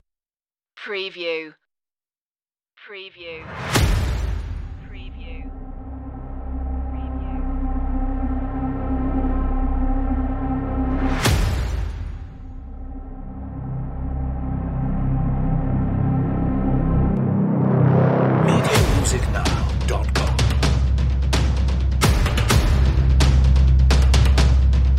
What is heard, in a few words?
bass drums sound fx only